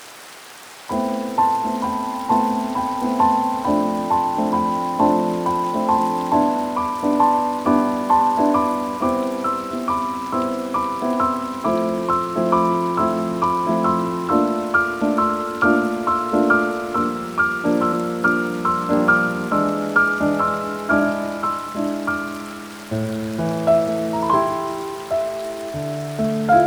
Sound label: Amore (Rain Music Box)